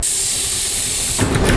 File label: closing doors, tram